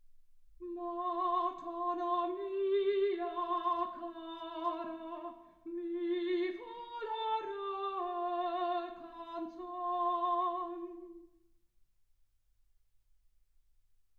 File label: soprano